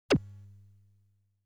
Normal Bullet Launch

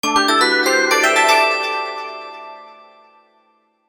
Last Turn Jingle

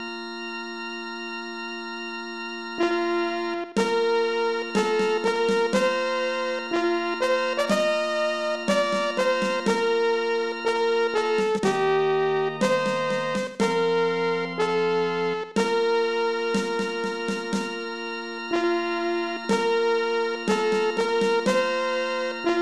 Victory; Super Dai Koukai Jidai; Super Unchartered Water; SNES

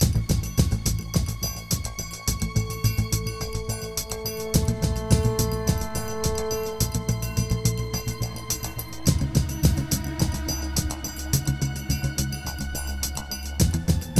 Stealth Loop; bolt 2008 pc gamerip

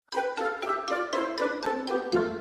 Battle Intro

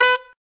B Sax

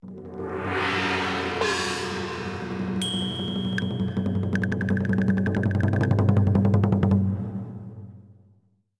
Intro Flourish; bolt 2008 pc gamerip

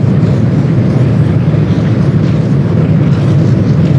SFX loop; Disney's Dinosaur

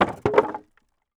IMPACT Wood Plank On Wood Pile; Infernal Radiation

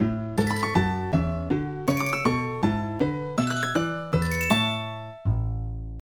Ambient; My Make Up; nds

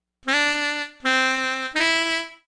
Sax

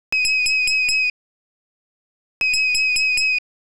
Formation Timeout

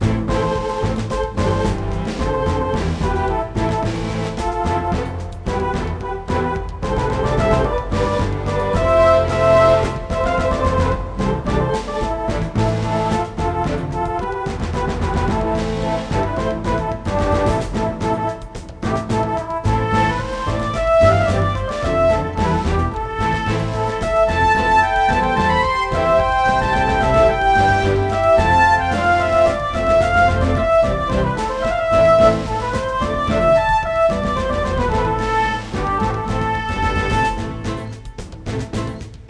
Music Battle Bed

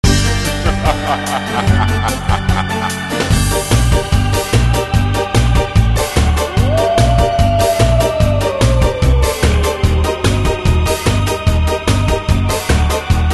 Music Game; SpongeBob SquarePants Boo or Boom

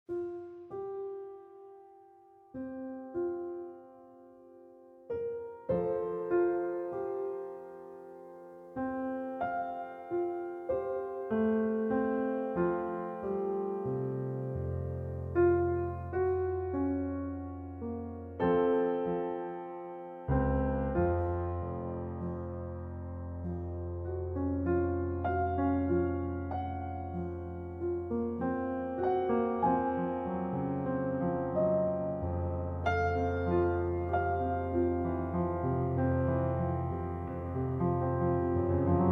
World Music

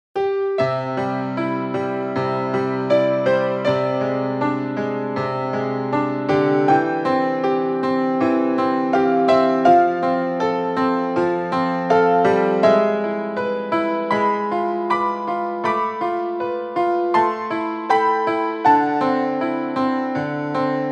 Piano; Cheerio

